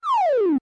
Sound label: Pause Close; Open and close the pause menu